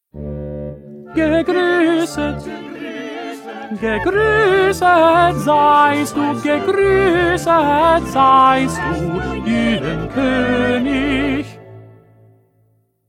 1st Tenor

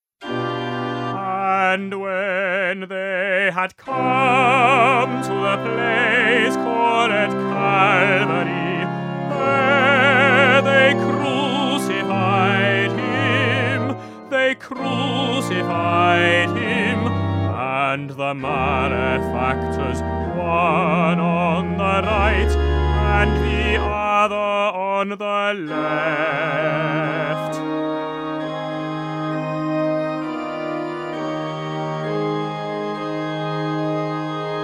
Concert Version

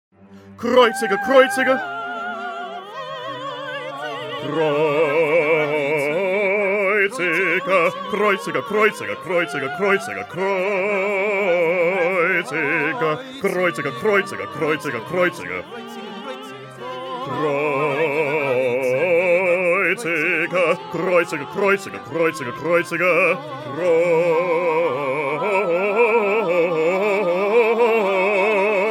Bass; Bach; St John Passion

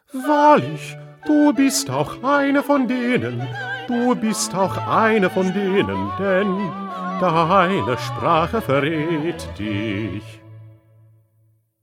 Bass; Bach; St Matthew Passion

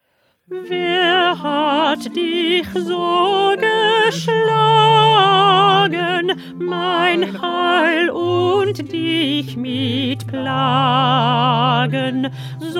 Alto; Bach; St Matthew Passion

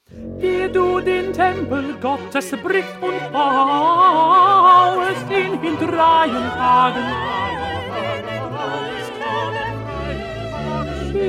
2nd Tenor; Bach-St-Matthew-Passion-67

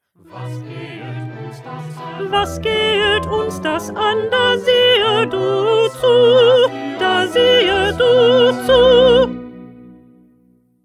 1st Alto

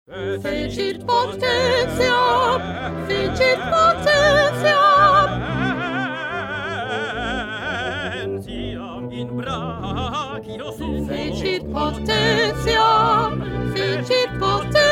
Soprano; Bach; Magnificat

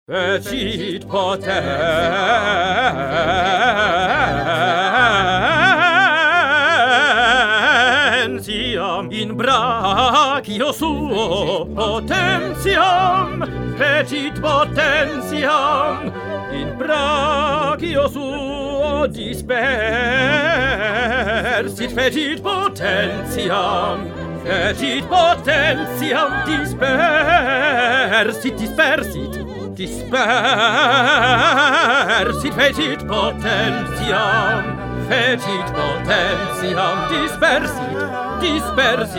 Tenor; Bach; Magnificat

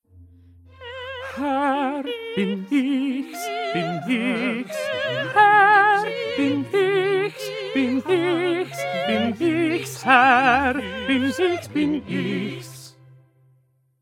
Tenor; Bach; St Matthew Passion

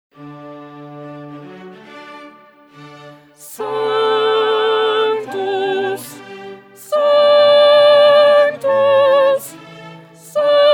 Alto; Schubert; Mass

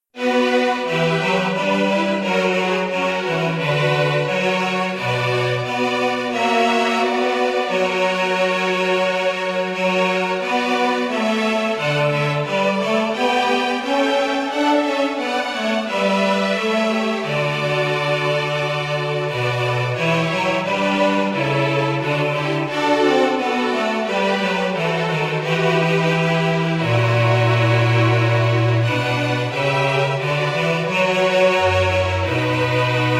Instrumental; Bach-St-Matthew-Passion-3

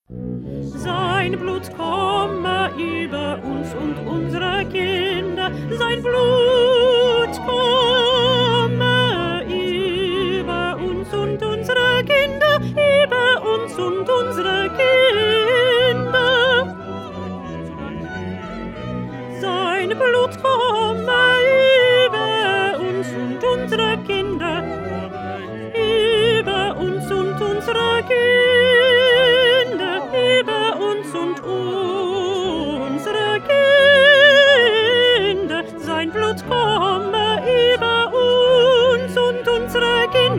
Alto; Bach; St Matthew Passion

